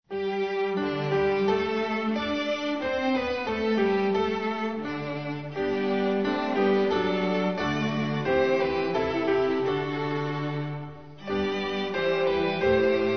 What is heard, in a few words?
saints behold how great jehovah instrumental; hymns portuguese